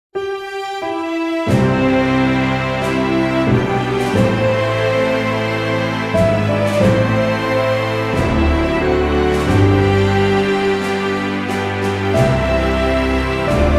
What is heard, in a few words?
national anthem traditional